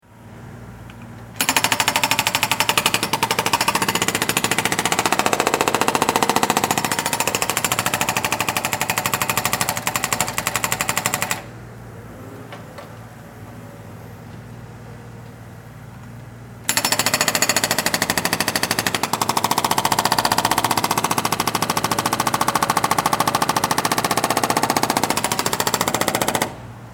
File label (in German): Presslufthammer